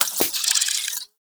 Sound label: Tranquilizer Rifle Dart Inject